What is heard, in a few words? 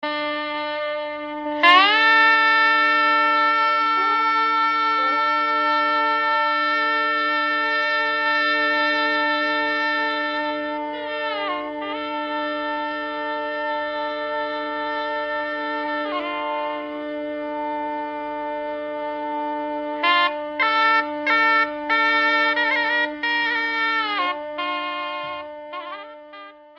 instrumental song